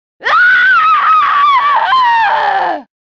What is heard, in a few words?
impaled scream; deception; kira